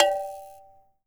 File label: LOW CUP GO, Cameroon; AKAI S6000 CD-ROM - WorldSounds; CAMEROON PRC